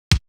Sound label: Kick, Drum One Shots; AMG - Coldcut's Kleptomania VOL-1